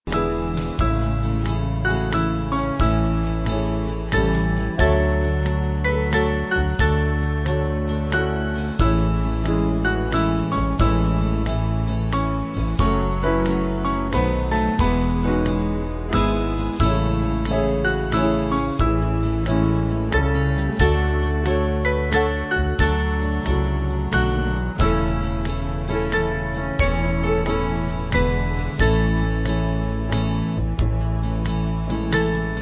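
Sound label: Band, Music Backing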